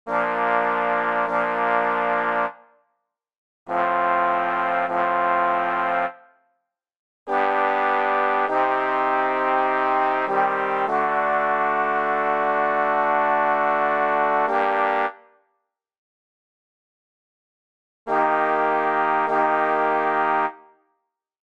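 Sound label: 12TET sampled trombone; Drei Equale no3; Marcel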